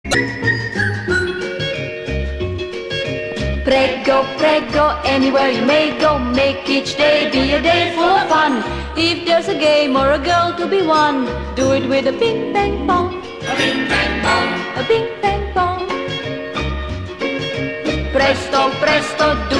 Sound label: bing bang bong